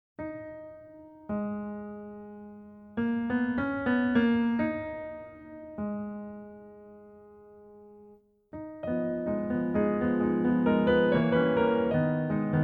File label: Adagio sostenuto; Drei Lieder ohne Worte Op. 2 Nr. 2